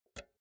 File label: pull out jack multi socket fast; objekte; elektronik